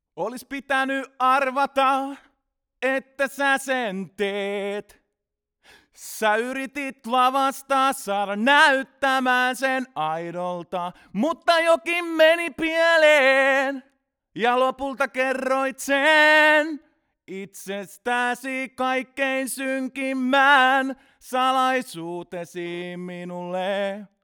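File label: male vocal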